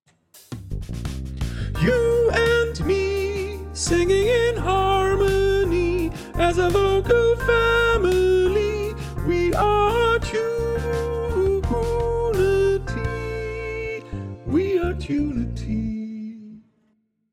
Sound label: mezzo pitched